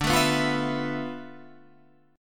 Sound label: D Minor 6th Add 9th D